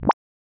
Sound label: blup